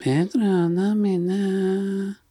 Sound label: Distant Village Bug